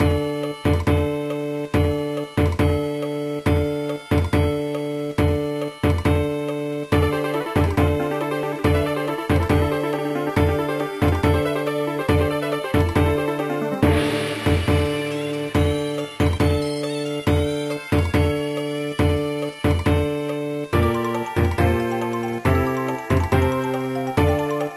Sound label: Cyclone Scuffle Music